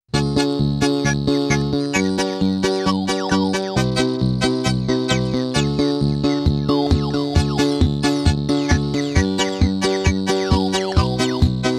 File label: Funk